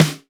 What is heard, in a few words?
SN LUDWIG; Best Service; Alpine Volksmusik